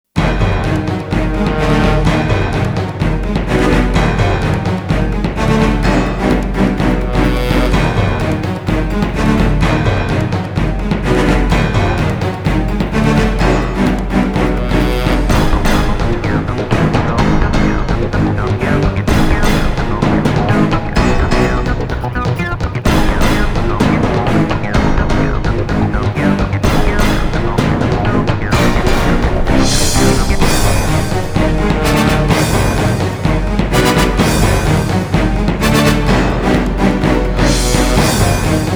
Emergency Shutdown; Chrome

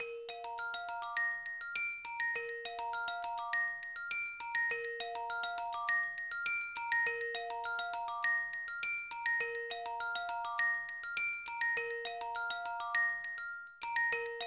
BGM Soft Loop; Baby Life; nds

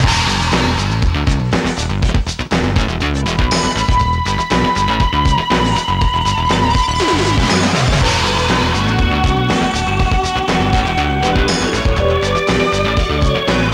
Overworld Level BGM; Twin Eagle; arcade

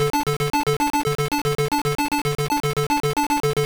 Level Presentation; Pengo; Game Gear

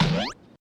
Boing; El Chavo: Una Feria en la Vecindad

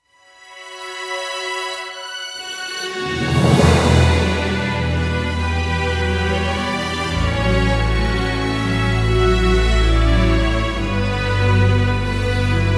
Moonlit Strings; Death's Gambit

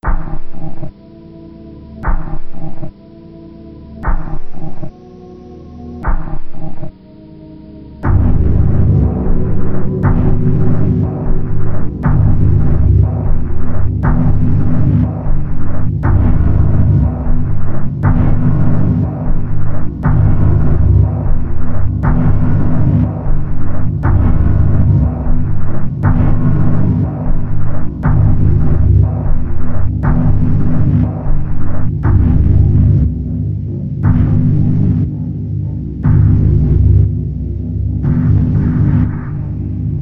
Writhing Sneezes; Spooky's Jumpscare Mansion HD Renovation; ps4

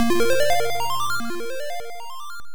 Treasure Chest; Dragon Slayer IV Drasle Family; MSX

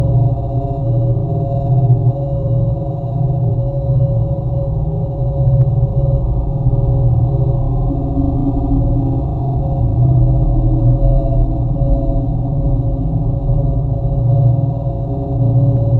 meltdown; brainpipe

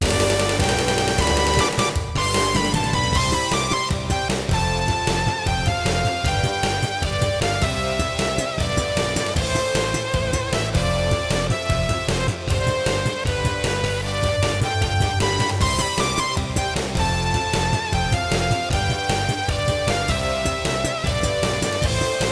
Win Arrange, SC-88 Pro; The Legend of Heroes III White Witch; pc-98 gamerip 1994

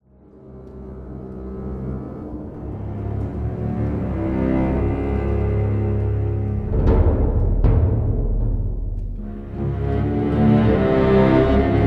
Mystery Music One Shot; battlefield bad company 2

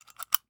bullet load in magazine; A Dump in the Dark

